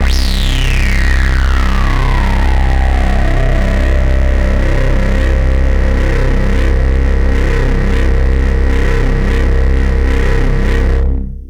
JX Sweeping Bass; AMG - Prototype Drum & Bass

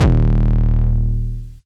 808 Dist - D#1, Kicks; Drums; MBase 11 - 808 Dist

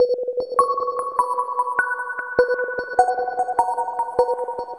Shimmer Mallet

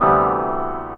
CHORD R, Drum & Bass 160 BPM; Ueberschall; Drum & Bass Flash Trackz

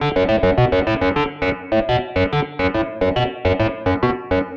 Clav, Indie Rock; Sonic Foundry (Sony Creative Software); Indie Rock Anthems

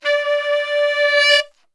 D3 sax

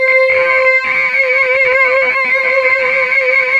AX60 LEAD; OTHER SYNTHS; Rubber Chicken Software Sample Bank

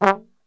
G1, brass; Best Service; Brass Super Section; TRMB SHOT; 90 s Sample CDs

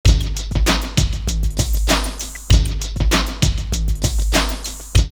Stomp 98, drumloops Rave; X-Static Goldmine; 90 s Sample CDs

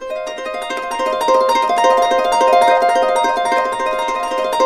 C, Harps; Complete Harps; USB Soundscan vol.62; AKAI

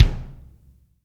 BD, DR BD; Best Service Gigapack 2

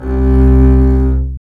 STR ARC BS, Cb Bowed, Cb1 mf vb

